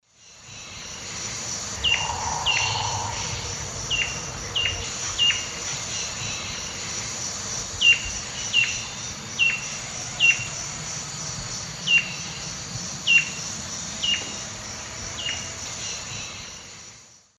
Herpsilochmus gentryi, call, S. America Eastern Lowlands